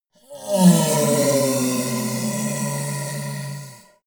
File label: monster dark growl hoarse; kreaturen